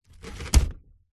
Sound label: foley basket hamper picnic set down on hard surface; zapsplat